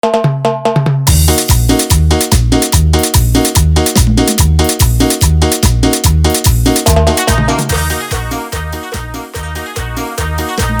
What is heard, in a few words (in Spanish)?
Instrumental, BPM 145